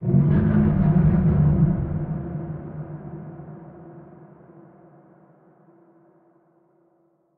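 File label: ambience unknown creatures kaiju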